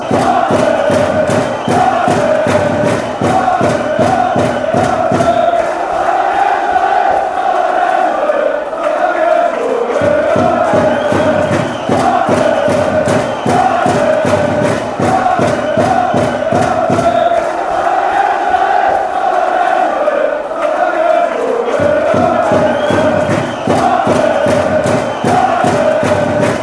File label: allez, chants